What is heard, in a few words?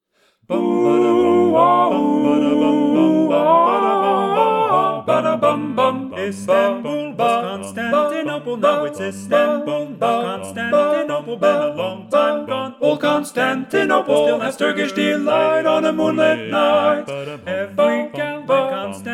Tenor predominant, ttbb